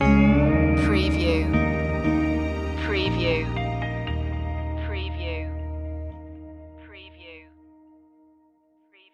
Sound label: prairie breeze stinger